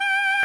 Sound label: Throat Chop